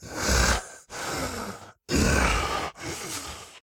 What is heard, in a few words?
Walk Cycle Burns; Devour; The Town; devour 2021 gamerip pc macos